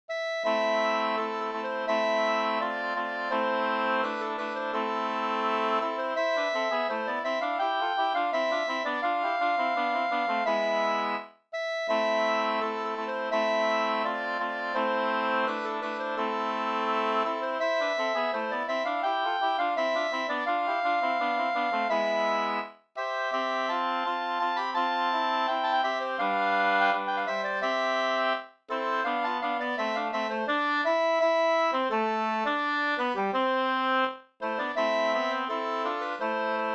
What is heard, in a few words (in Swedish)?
skanklat